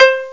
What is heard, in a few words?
koto sharp; Amiga Special - Software Hits; orchestra; keystring